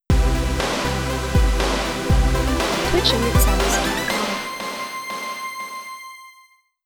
Synthwave Cheer

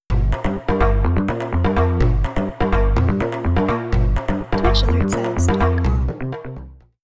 Jungle Raid

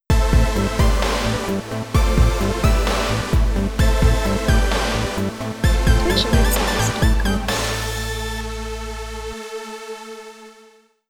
Synthwave

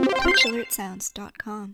Retro Follower

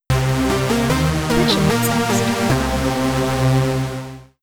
Synthwave Follower

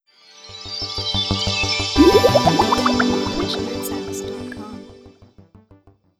Atmospheric Sub

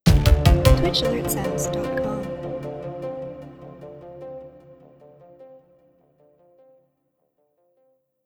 Simple Follow

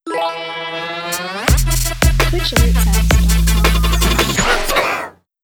Trap Follow Sound

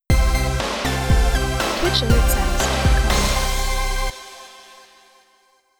Synthwave Donation

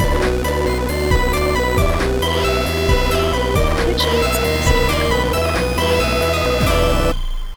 8-bit v2 raid

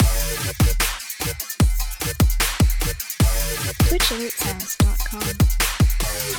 Dubstep Follower

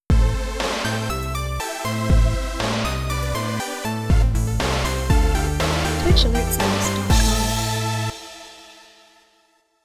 Synthwave

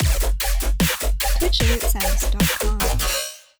Dubstep Cheer

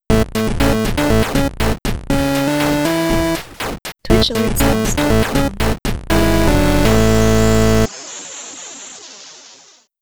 8-Bit host

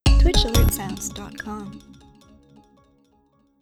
Simple 1 Donation

